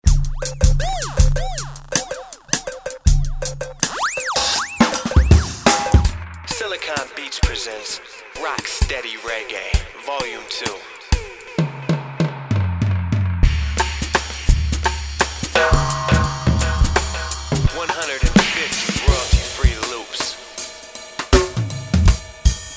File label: rocksteady reggae